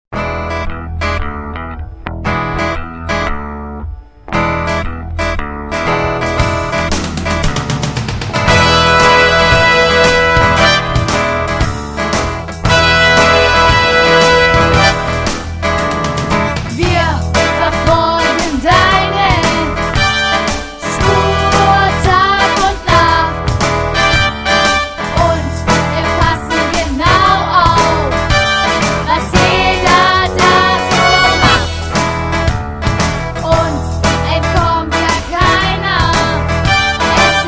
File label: Blues